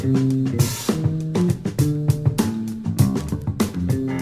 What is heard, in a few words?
full odd timing